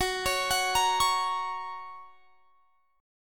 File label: F sharp Major; x,21,23,23,23,21; arpeggiated